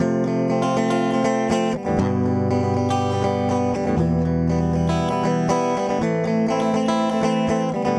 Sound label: arpe